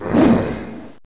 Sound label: attack zombie